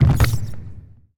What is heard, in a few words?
Basketball Bodyfall